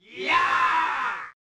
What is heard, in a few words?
"Yaaaah!"; Zig Zag Cat Dachou Kurabu mo Oosawagi da; snes